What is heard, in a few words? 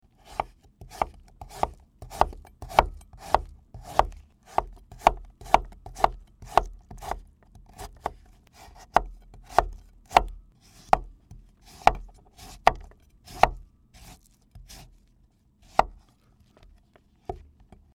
Kabosu cutting